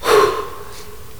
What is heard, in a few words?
whew